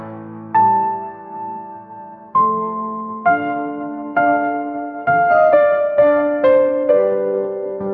sad piano; sound effects

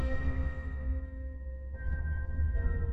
android notification; sound effects

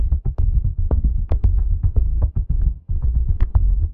robot beep; sound effects

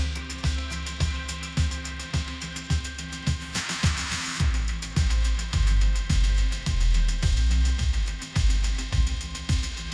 corporate sting; sound effects

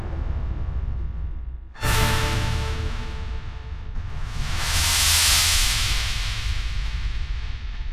epic sting; sound effects

